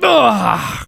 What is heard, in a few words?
painsevere; Civilian